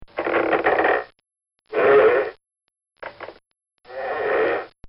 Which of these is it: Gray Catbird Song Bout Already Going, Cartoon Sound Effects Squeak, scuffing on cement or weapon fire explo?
Cartoon Sound Effects Squeak